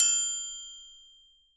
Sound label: TRIANGLE OP, BRAZIL